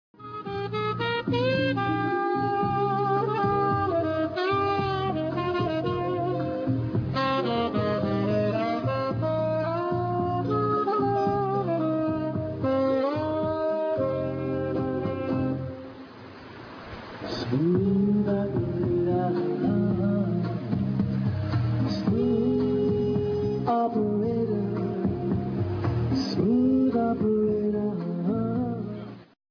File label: smooth jazz